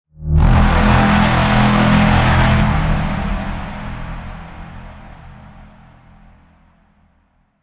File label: Tension Builder Impact